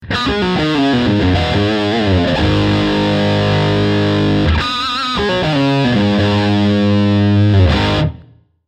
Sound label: König Blue Note Röhren Amp Les Paul Distortion